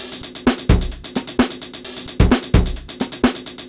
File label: Break Reprogrammed